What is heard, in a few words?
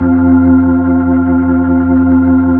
B-3, Full Xfade; Roland LCDP10 Keys of the 60s and 70s 2